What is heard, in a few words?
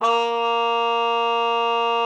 A#3, TRUMPET; Best Service ProSamples vol.42 - Session Instruments; PS-42 Session Instruments